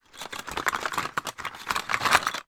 toy plastic glow in the dark stars movement in card box